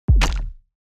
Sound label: room damage kick